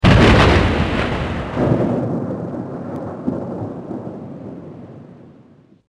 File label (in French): Orage